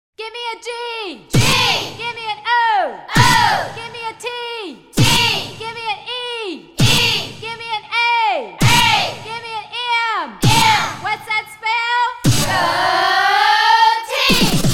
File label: Go TEAM! vocals; Soundzabound Volume 3 Disc 2